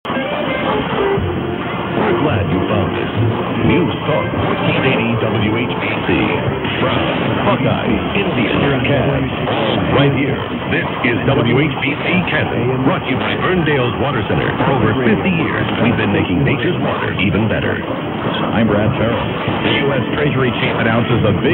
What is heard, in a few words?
1480 canton, mw dxing; whbc